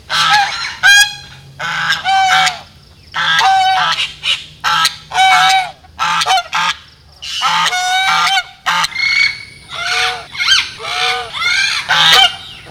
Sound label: ducks parrots; best service Blue Box